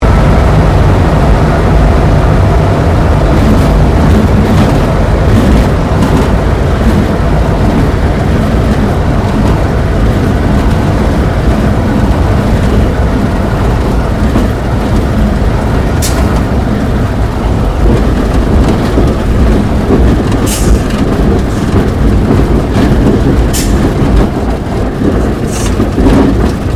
AIRCRAFT SUPERMARINE SPITFIRE MK-XVI LANDING INTERIOR; Airplanes; Airplane Sound Effects